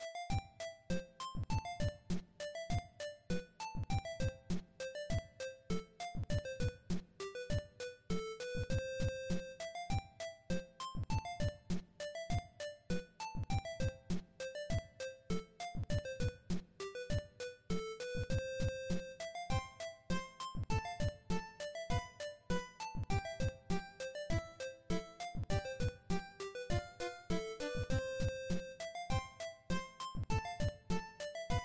music cheerful; bgm

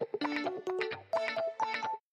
game start

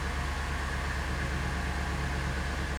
car engine run loop

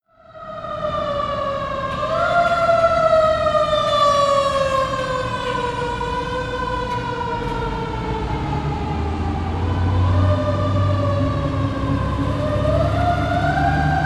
fire truck

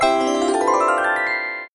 right answer effect

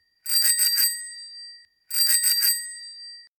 bicycle

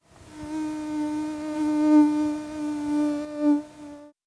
mosquito

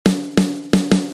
snare

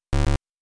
wrong answer

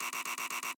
wrong answer

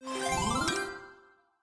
right answer effect

